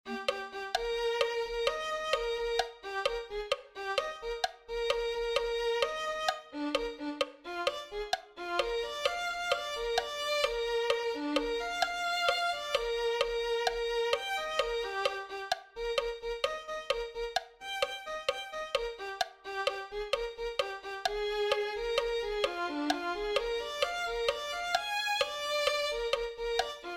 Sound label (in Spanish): no recordeis las cosas del pasado violin